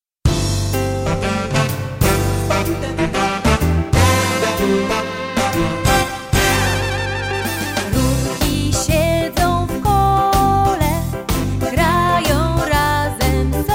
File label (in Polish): Rurki sie w kole wokale